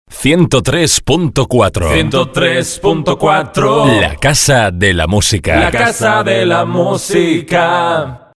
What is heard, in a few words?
1034 jingle spanish, Dial cantado; 103 punto 4